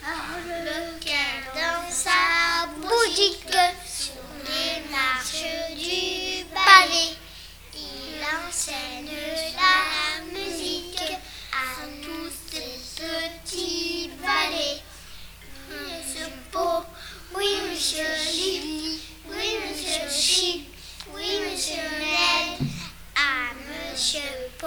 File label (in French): arlequin, chant